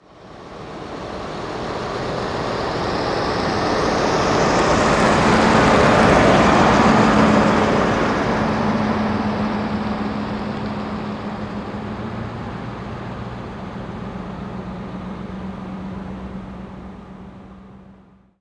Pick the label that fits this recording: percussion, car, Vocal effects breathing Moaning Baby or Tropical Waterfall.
car